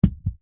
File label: stone large set down thud; foley